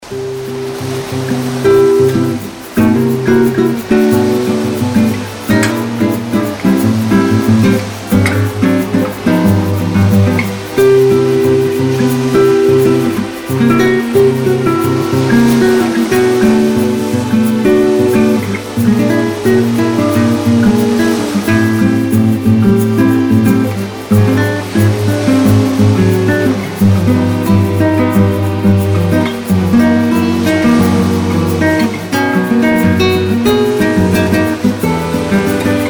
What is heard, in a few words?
guit suit; guit suit2